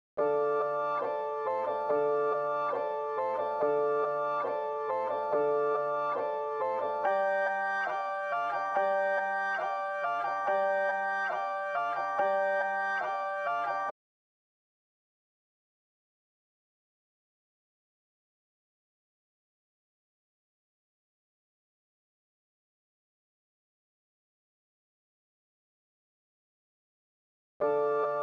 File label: 140 BPM D Min Piano, Drill; Loop Stems; Cymatics; 2022 Melody Collection - Drill; Woi